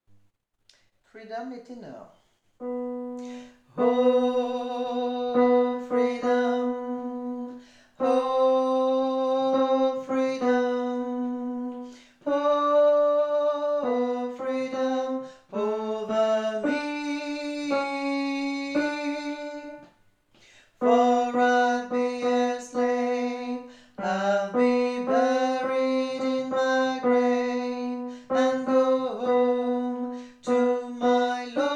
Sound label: freedom tenor